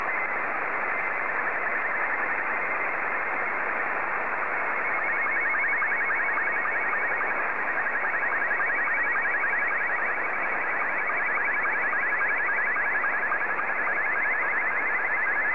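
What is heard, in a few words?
SSTV, Scottie 2; G3PGA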